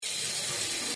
shower; veryshort

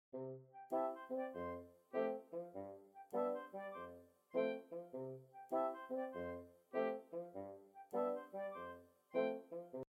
happy Music Background slow

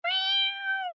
animal cat meow

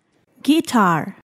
guitar

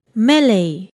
melee

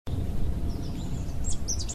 sunny day sfx

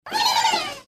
penguins

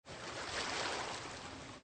ocean Waves crashing on the beach sound slow

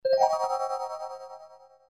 twinkling idea accent with up scale

